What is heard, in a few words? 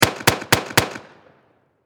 Warfare Pistol 45 Magnum Four Shots Close Perspective; Pistols